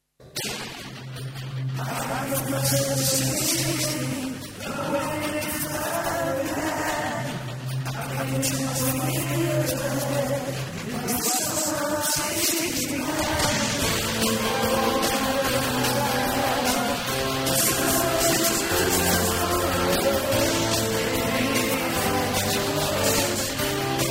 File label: Rock